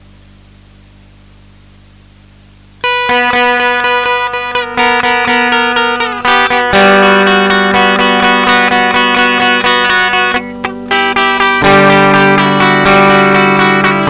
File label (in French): theme guitare seche; Diablo 2